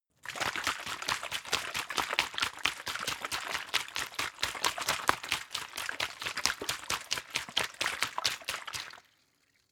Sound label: bottle water shake long; gastronomie; berufe